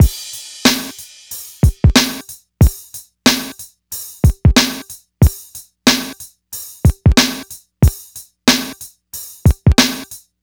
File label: Hip Hop and R&B Drumloops